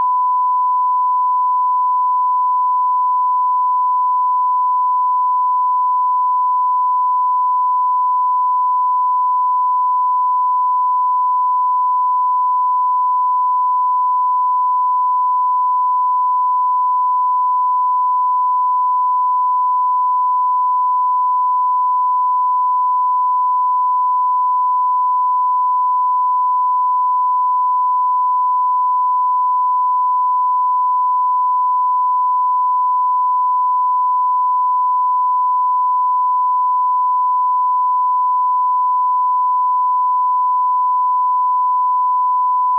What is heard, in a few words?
1kHz Tone -12dB